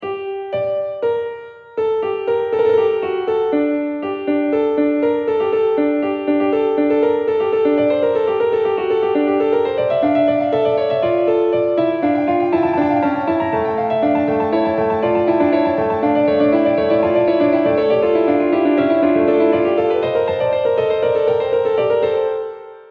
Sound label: Keyzone Yamaha